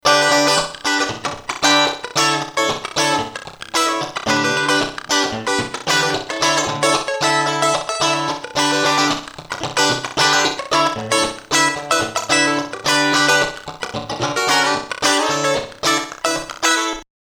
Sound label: LD 5 Room